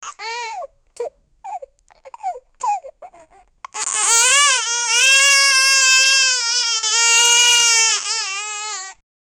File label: baby cry